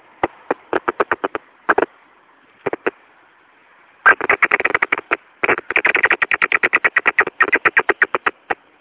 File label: Bats